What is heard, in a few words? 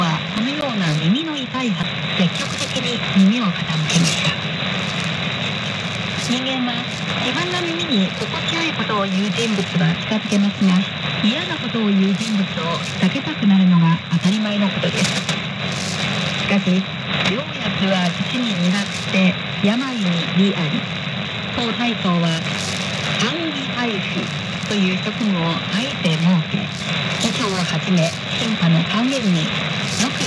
7mhz; colibri